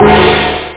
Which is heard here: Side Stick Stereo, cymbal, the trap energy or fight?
cymbal